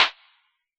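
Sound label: abstract kit snare; Dr 202 Kit 1; Percussion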